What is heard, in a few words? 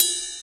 Ride; Equipped Music; Loungin House